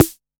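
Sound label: Analog MT40 Snare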